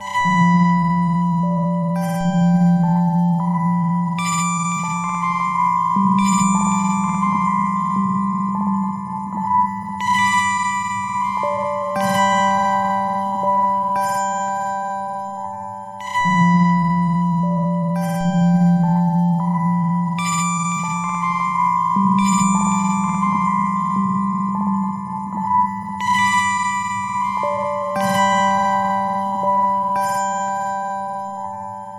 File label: Shape Changing Tones; Sonic Foundry (Sony Creative Software); Loop Noir